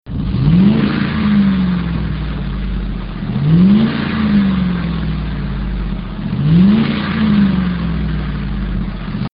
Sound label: F-150 5.4L Super Truck